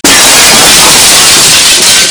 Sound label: glass; quake2